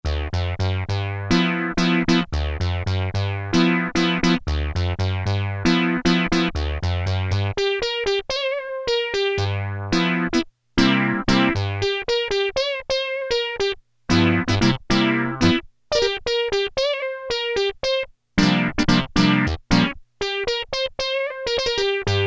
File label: synth guitar; Tones